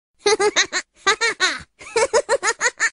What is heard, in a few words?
laugh giggle; teemo